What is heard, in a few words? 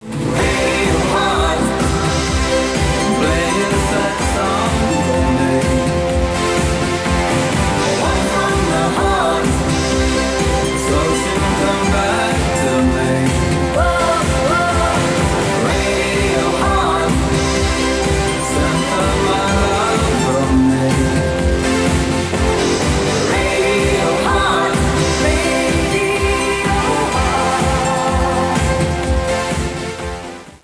radio heart